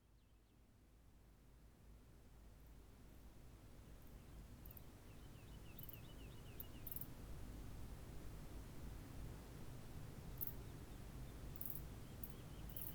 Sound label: soundscapes